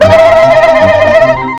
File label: blre blre blre